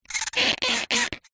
vibrations cello bow multi timbre cardboard tag short; sound spark